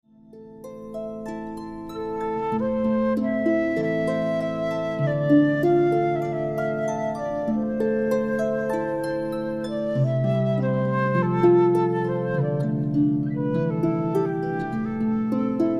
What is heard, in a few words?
Harp Flute Duo